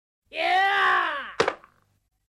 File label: kia